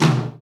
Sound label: TOM TOM, Real